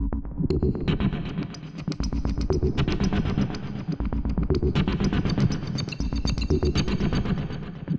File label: Rhythmic (120BPM); musicradar